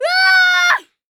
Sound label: Child Scream; Don't Be Afraid The First Toy